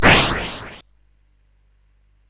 Dark Castle, CSG8580R5

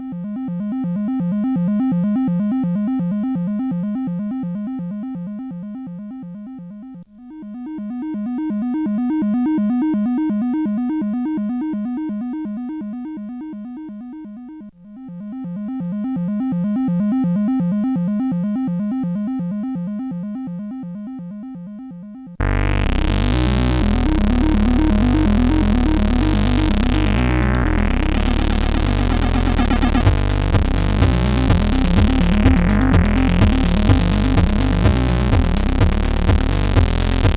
Techno sid CSG8580R5; Erotic Techno Phantasy Colection Volume 1

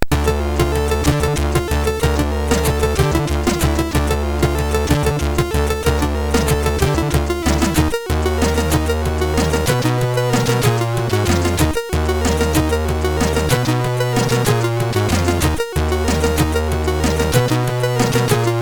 Waltz, MOS6581R3

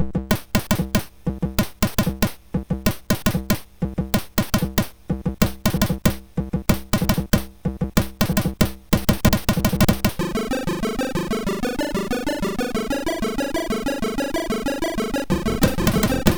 Happy Hardcore sid MOS6581R4

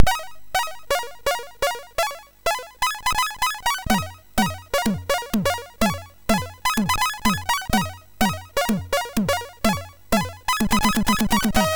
Groove, sid MOS6581R3

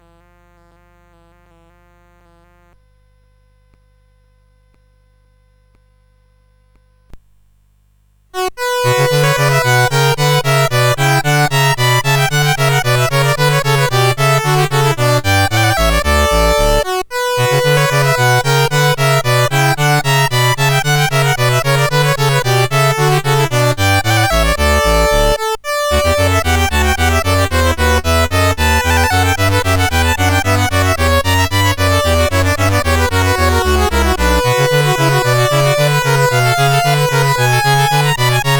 Bouree, CSG8580

